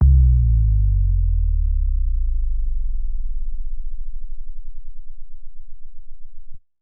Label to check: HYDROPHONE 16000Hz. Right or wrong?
Wrong